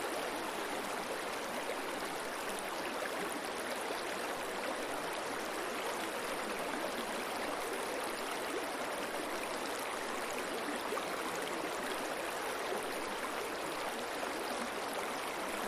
stream, nature

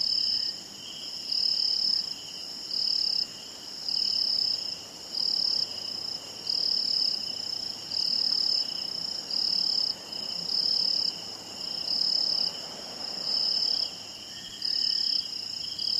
night, nature

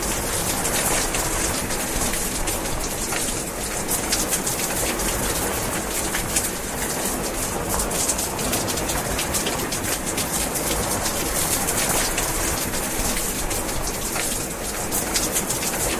dripping eaves, rain

